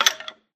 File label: skeleton death, mob; Minecraft